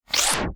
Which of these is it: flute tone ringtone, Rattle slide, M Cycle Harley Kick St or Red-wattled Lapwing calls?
Rattle slide